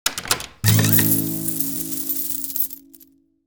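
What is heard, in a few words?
Chest opening; Minora-Game; Sound-Artlist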